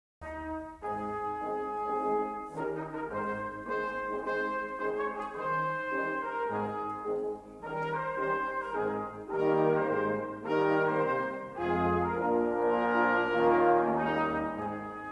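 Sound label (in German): Triumph Marsch Orchester; Verdi Aida; blechblaeserquartett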